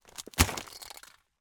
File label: Ice Impact Debris Heavy Single, Icy Snow; Soundopolis; Icy Snow 2 HD